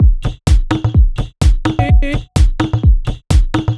B 127bpm, House Drumloops; Zero-G House Fabrik Drumloops; ZG Drumloops

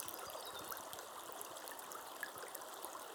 Waterway G Light

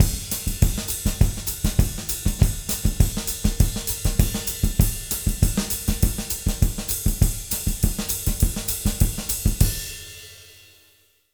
200 SAMBA, Jazz Latin Drumloops; USB Soundscan vol.08; AKAI

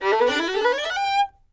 FIDDLE riff G, FIDDLE FX; Sampleheads; Whole Lotta Country 1 & 2

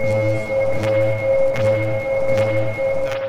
Good Vibez Lo Fi A#2, Lounge Atmospherez

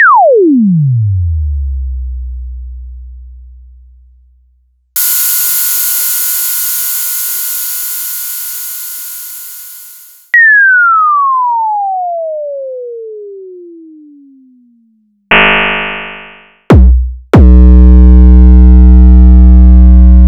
Sonic Core EDS16; m8

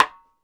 CLICK, X-STICKS; Sampleheads; New York City Drumworks VOL-1; KD X-STICKS

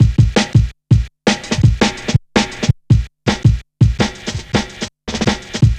Check A, Jungle, Drum Loops 165.5

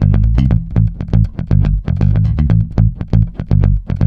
A, groover, bass; Spectrasonics; Bass Legends

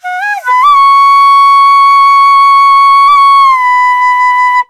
VENU, India Winds; World Combos; E-MU Producer Series Vol. 7 – Old World Instruments

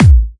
Electro Kicks

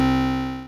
synth, amiga med

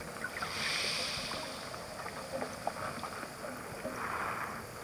Cove Cave Dark, Water

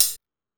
Techno, EMU XL-7

